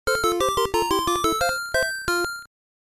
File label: Pokedex Fanfare; RBY